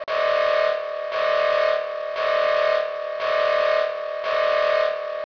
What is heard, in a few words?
bunker siren